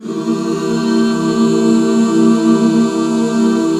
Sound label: C 7; Best Service Gigapack 1